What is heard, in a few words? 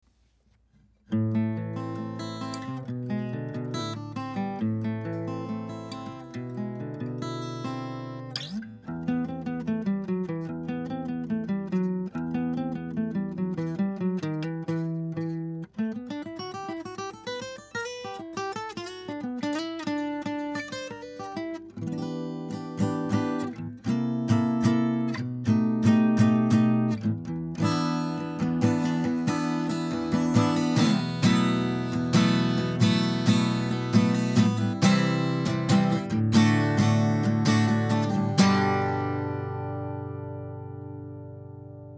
Acoustic